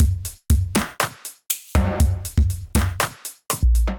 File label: Vibin Kit, Drums Hybrid; Ableton; Factory Packs; Drum Essentials